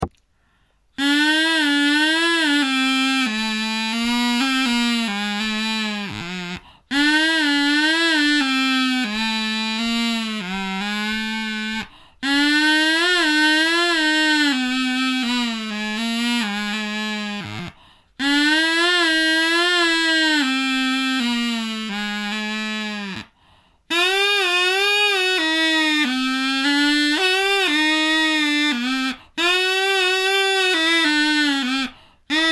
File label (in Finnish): Groovy ruokopilli